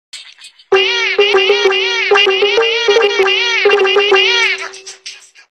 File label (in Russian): мяу мяу мяу мяу мяу мяу мяу мяу